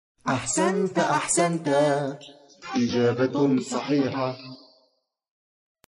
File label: good correct answer